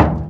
Northline Transit Center Construction Dumpster, percussion; Houston